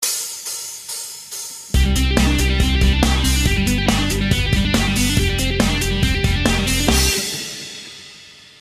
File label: taps